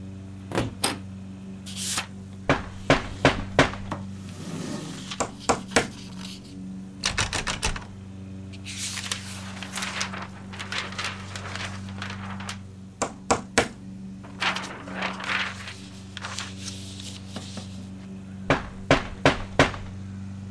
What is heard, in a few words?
inspect; Buildalot2